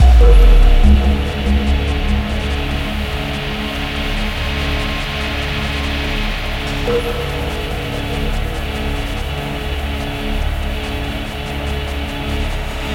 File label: The Boat Ambience; mirror's edge gamerip